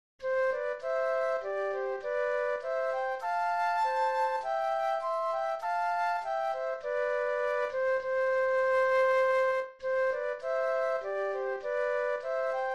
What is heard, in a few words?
Flute Duet